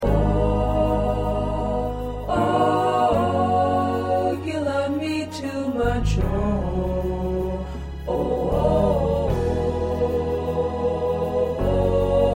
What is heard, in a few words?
Remix